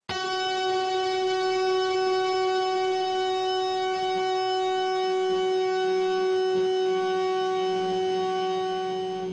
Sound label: heavy harmonic tune up 3rd